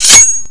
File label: katana deploy; surf cso